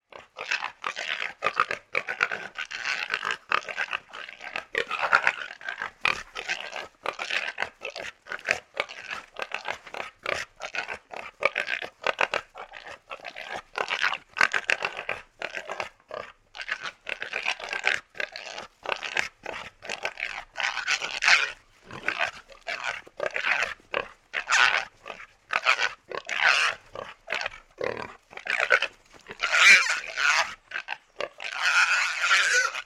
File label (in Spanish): CERDO GRUÑE GRITA Y SE MUEVE ALTERADO; animal; Chancho